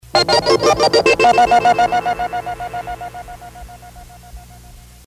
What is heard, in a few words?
wx tone, jingles; 1970; WDRC used these electronic tones to tag all live weather forecasts; KGIL